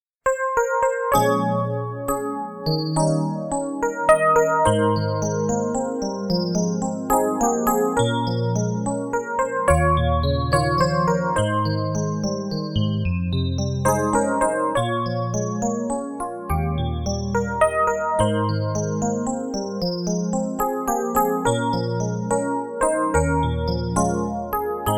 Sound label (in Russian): музыка